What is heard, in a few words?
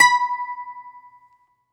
GR. BAZOU, Plucked Strings, Greek Bazouki; E-MU Producer Series Vol. 7 – Old World Instruments